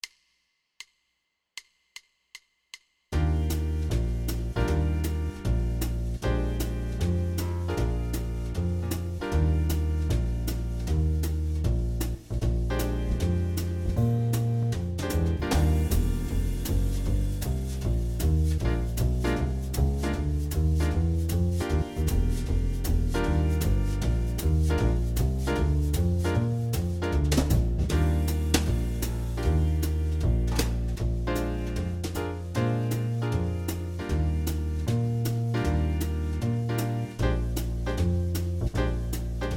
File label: Eb major track